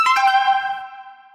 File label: notification; franklin; gta v